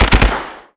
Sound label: AK47 3 shot; SpecOps2 MPlayer Demo